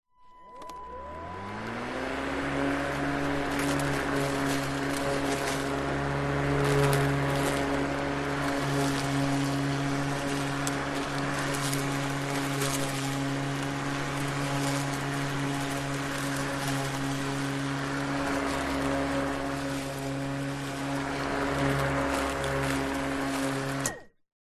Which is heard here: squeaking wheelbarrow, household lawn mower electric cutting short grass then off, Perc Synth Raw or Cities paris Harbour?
household lawn mower electric cutting short grass then off